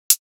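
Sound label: needle hihat closed; breakout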